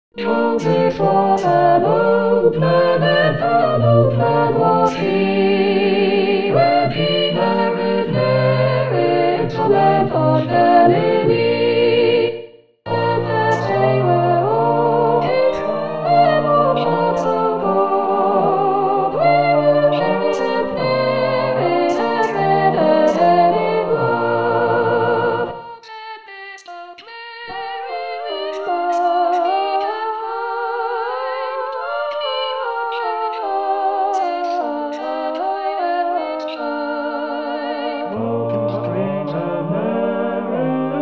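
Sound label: cherry tree Soprano 1; Cherry Tree Carol; xmas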